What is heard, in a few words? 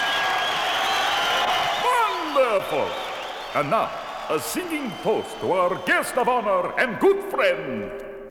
A Singing Toast